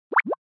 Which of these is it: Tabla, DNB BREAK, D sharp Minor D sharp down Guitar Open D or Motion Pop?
Motion Pop